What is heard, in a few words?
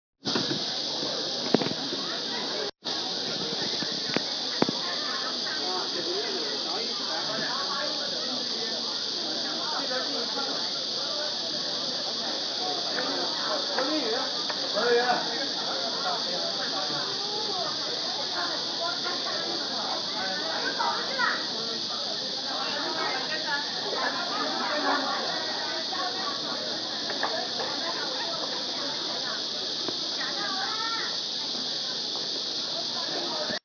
chengdu cicadas, Sounds of Chengd